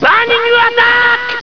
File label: system default; dragon ball z